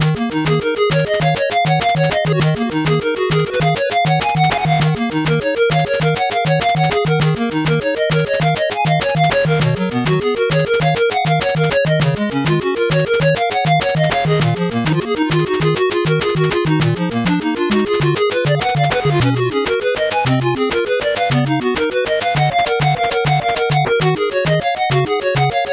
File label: 8bit bgm normal